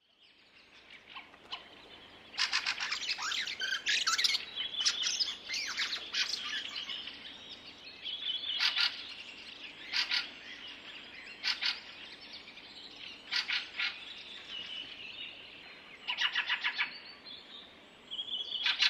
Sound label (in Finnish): rakattirastas